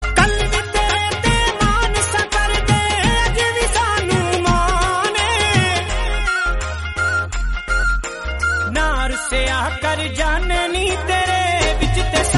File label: punjabi song